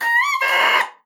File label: OBOE3; Xsample VOL-6